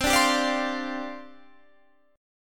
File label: C Suspended 2nd Suspended 4th, down, Guitar, Standard; Csus2sus4 strummed; JGuitar